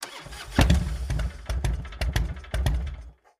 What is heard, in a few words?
harley start stall, harley-davidson